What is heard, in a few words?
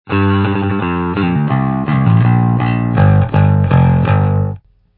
bass distortion; pick 2